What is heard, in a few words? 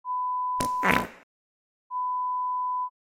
Fart sound